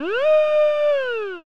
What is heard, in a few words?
monster wolf howl